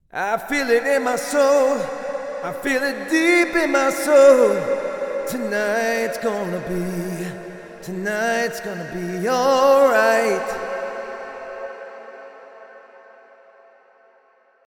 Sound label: Reverb Vocals Long and Bright; CRTIV Reverb